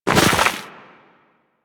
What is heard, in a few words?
Walk Deep Snow, Footsteps; Warframe; Operator